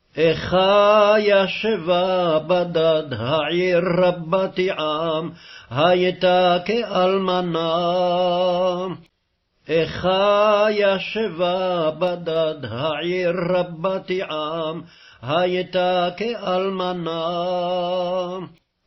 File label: chant; Lam. 1:1a Hebrew chant